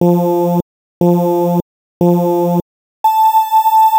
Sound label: count down